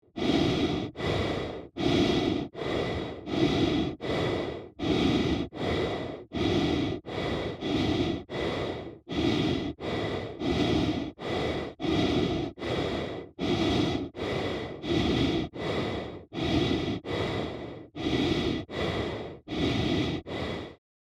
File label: Gas mask anxious breathing; military equipment sound effects